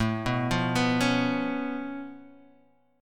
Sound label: C sharp Augmented 7th A, chord